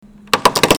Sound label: equal spacing